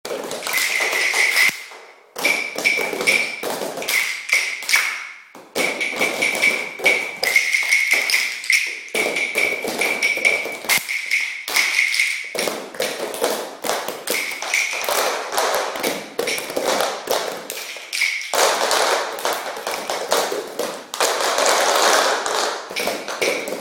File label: percussion project